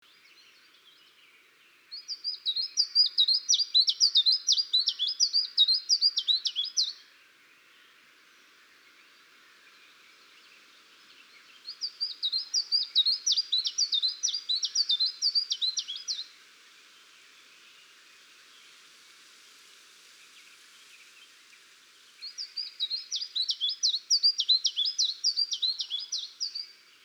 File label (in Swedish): Sibirisk gransangare